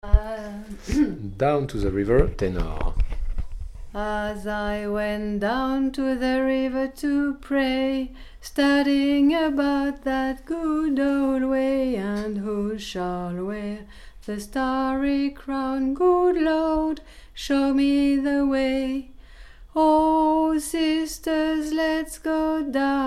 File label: tenor